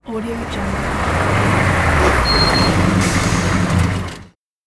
the car brake

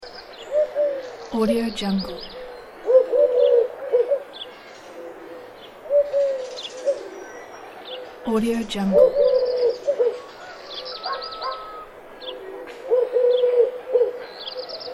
morning village birds

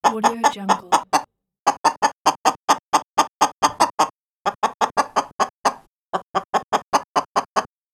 hen

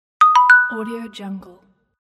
log in

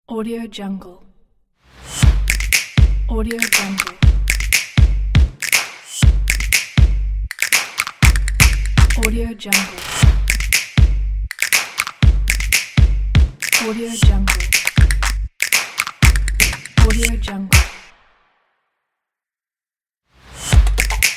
claps and snaps logo

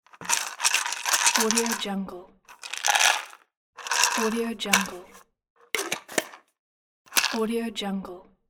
jar with drops

